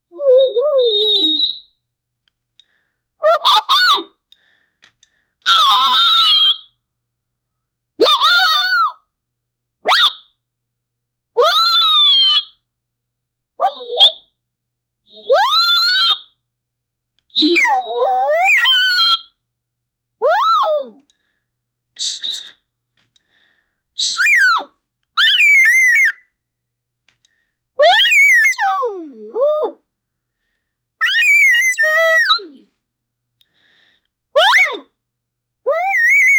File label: funny cartoon bedsheet gh